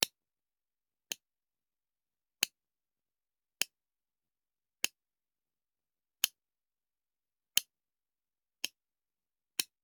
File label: Hand Gun Foley; Sound Ideas; Guns